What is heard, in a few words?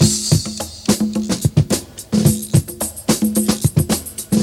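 African Music Machine Tropical, left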